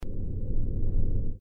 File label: asteroids sound effect